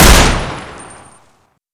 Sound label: fiveseven; weapons; fokku tc usp